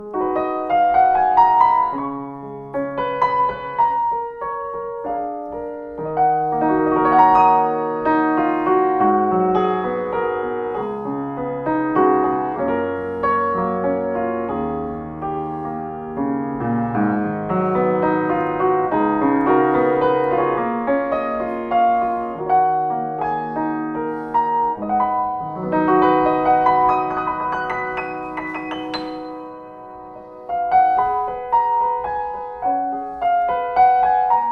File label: C. Bechstein model 8, piano